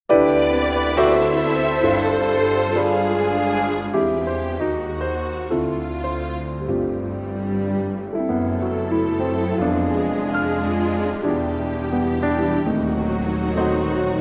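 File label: C orchestra; key - C; Stunning orchestral arrangement of this wonderful festive gem, with the verse included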